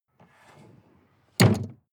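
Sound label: sports car exterior trunk close; verkehr-transport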